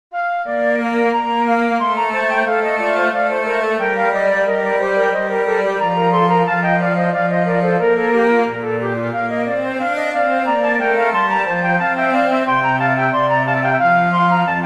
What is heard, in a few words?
chorus for flutes cello; bach johann sebastian; ich bin der den nig